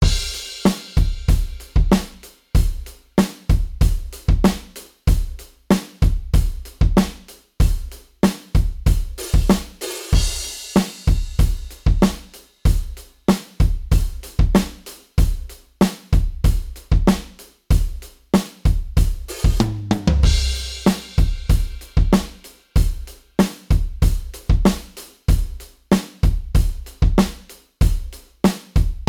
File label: modal surf, drums